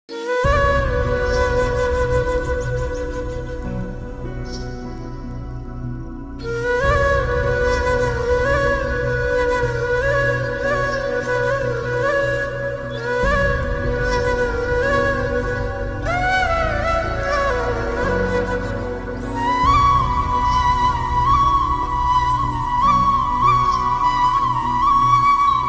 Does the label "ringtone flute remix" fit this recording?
Yes